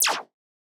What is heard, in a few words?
scifi weapon synth blaster